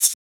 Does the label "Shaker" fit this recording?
Yes